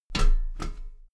step metal ladder step top regular

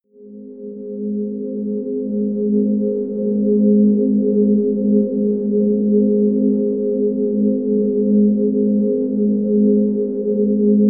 drone antimatter

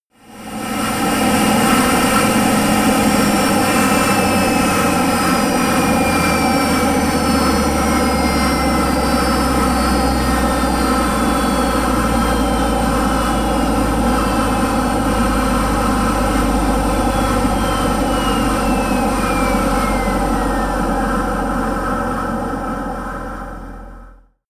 plane boeing 777 turbine slow down; flugzeuge verkehrsflugzeuge; verkehr transport

